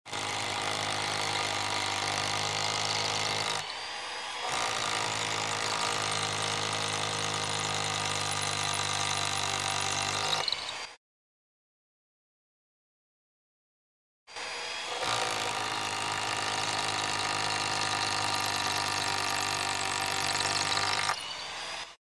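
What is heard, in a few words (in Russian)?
ручная дрель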